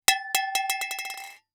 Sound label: Impact Cement Hit By Medium Metal Pipe